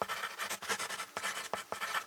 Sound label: Pencil Write On Paper; SFX Kit; Foley - Misc